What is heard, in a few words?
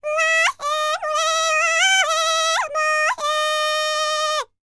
MACEDONIAN, World Voices; ILIO; Vocal Planet VOL-5